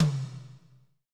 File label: tom, Pop Country; Northstar; Drumscapes Roland